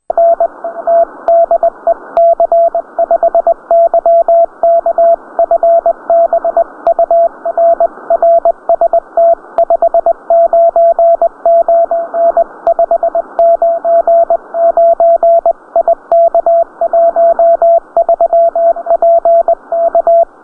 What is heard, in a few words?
CQ CW; C5YK